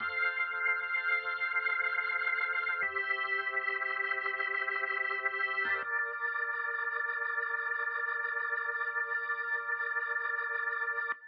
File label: Gospel F 85bpm organ; Talk To Me Gospel 4